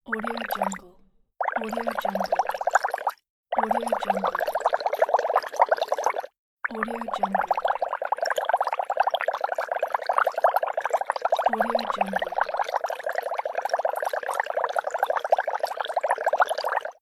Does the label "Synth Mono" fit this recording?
No